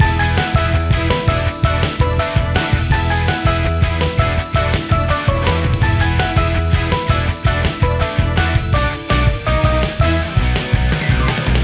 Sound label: bgm battle